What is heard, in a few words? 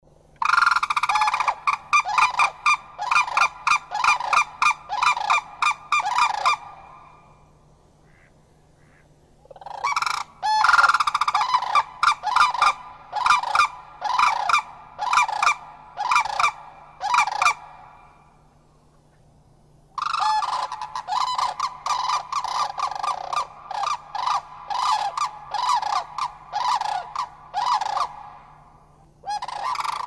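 cranes calling in unison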